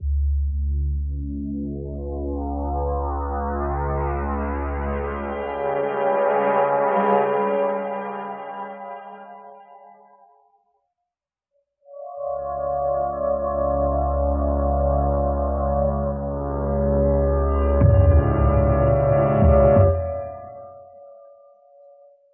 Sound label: techno nightmare, Electronic Loops